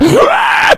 rage, infected, male; Left 4 Dead 2; Source Engine

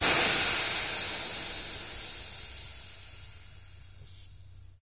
steam release, machines

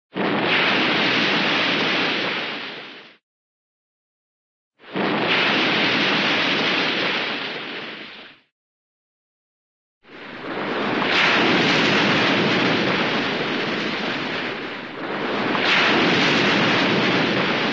water, ocean single large wave crash; cartoon

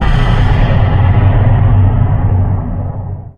mysterious perc; Source Engine; tf

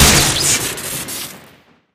sniper shoot crit, weapons; sydneysleeper; tf

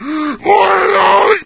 zombie voice idle; Source Engine; video game; npc

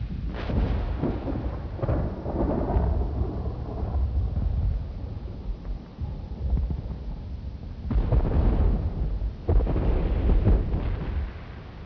arty boom, ambience; Source Engine; dod

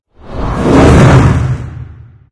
shaft flame by; video games; ambient; source engine; portal2; outland

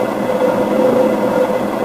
wood plank scrape smooth loop

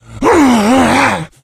shoved, npc infected; Left 4 Dead 2; Source Engine

